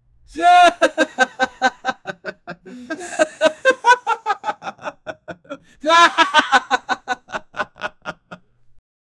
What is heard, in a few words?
men laughing; voices